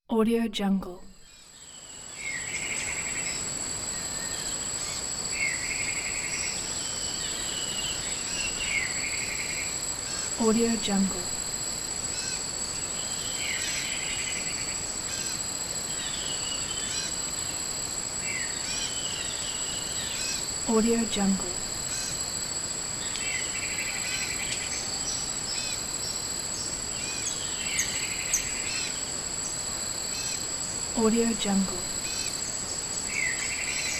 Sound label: calm night in jungle